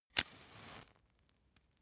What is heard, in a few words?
match light short